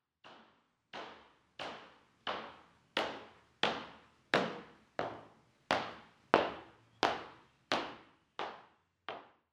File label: steps on parquet floor